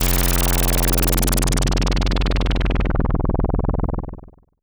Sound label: SH-09 Tone Drop